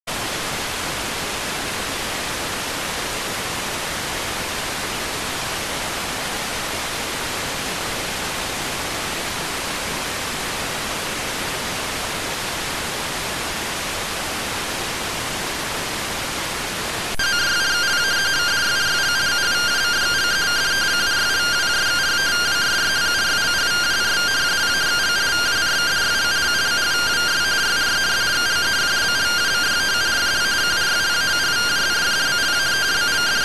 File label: rtty rx